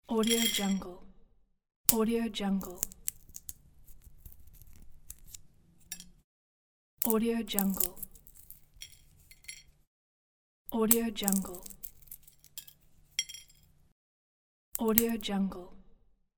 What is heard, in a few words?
pouring and cracking sunflower seeds